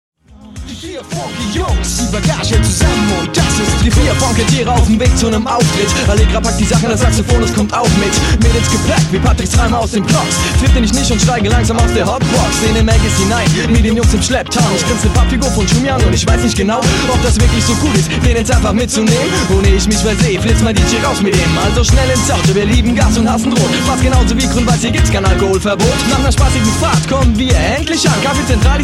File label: Funk